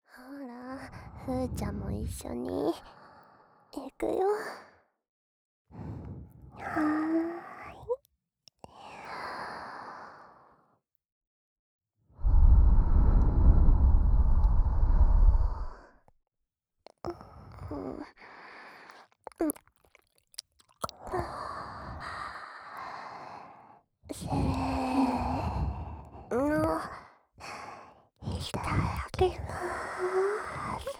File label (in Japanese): 両耳元で精液もぐもぐくちゅくちゅごっくん